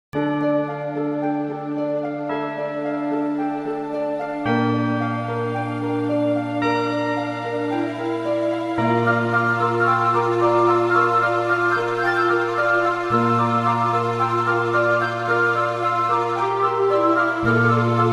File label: melodia minus